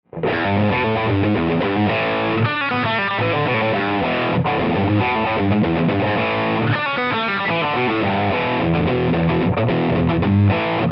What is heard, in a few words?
X3L Plexi Rythm